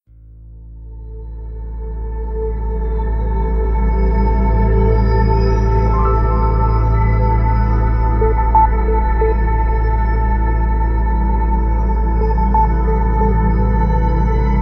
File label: Golden Star Ambiance; SafeMusicList